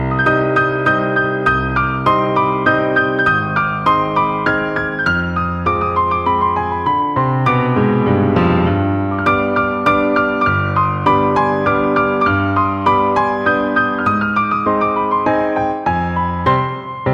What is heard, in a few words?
Piano